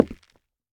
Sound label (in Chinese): 下界木踩踏声